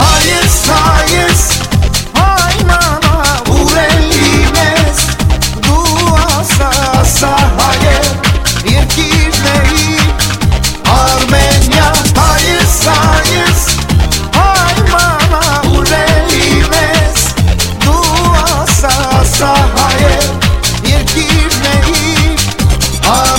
Armenian

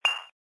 notification

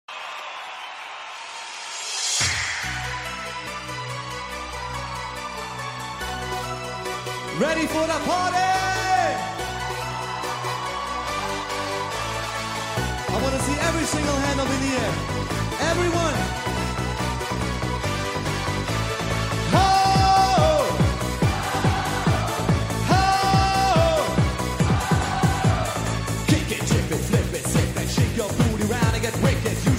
Rrrrr

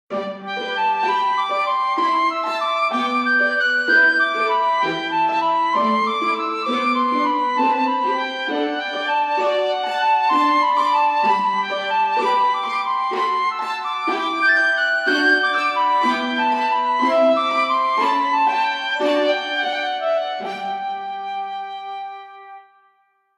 Orchestral Ringtone

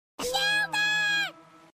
Shellder Cry

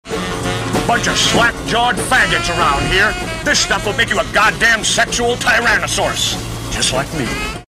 Pop

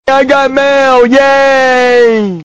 Yaaaay

